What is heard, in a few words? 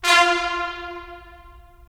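brass trumpets staccato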